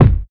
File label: smooth bass drum one shot a key; kick drum samples